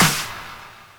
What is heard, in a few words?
Clap Snare